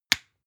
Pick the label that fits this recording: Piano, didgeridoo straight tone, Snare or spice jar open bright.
spice jar open bright